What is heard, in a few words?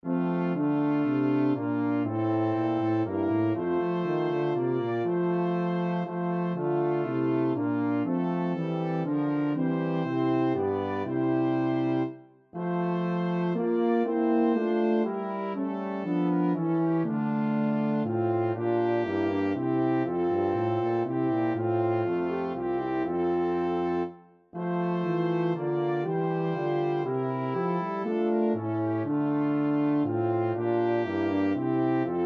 brass quartet